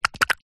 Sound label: cartoon slime movement fast